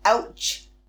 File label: ouch; dollsHouse